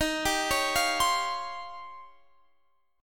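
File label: E flat 13th E flat, down, Guitar, Open F; Eb13 arpeggiated; JGuitar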